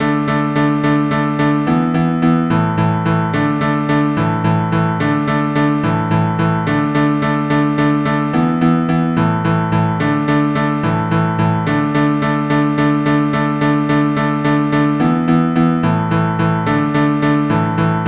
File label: drone chords only; Top of Cork Road